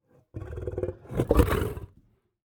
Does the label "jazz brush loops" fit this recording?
No